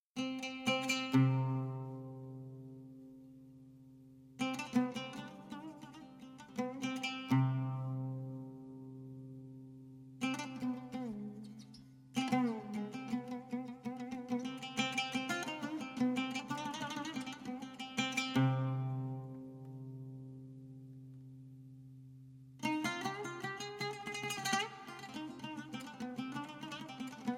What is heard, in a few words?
Oud, Middle Eastern Band